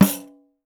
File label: 10 PANDE, PANDEIRO